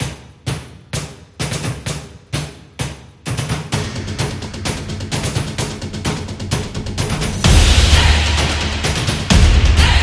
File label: The Sport Beat